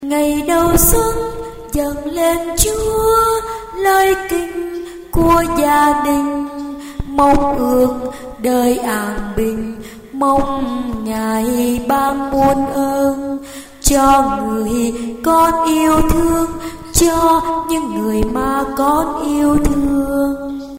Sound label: basso tenor